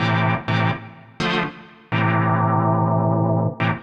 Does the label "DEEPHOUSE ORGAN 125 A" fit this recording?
Yes